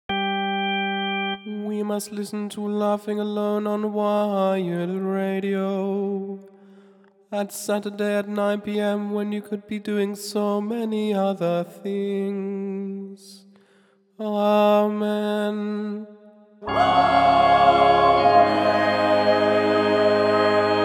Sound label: Choir